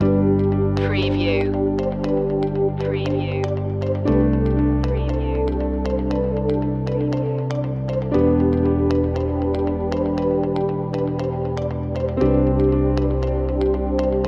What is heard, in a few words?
corporate skies loop